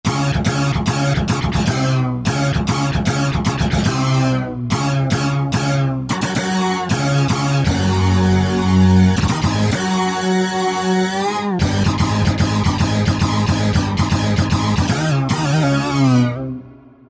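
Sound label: Lead; Yamaha EX5R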